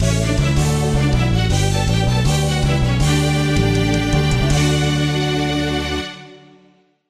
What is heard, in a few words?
victory, jingle